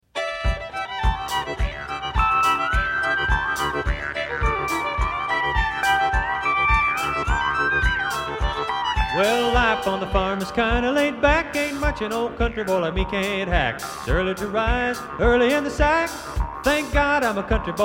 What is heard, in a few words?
Stereo Band & Vocal Mix